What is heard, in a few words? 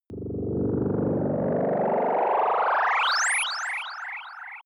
warp load